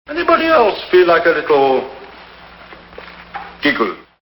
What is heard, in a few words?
GIGGLE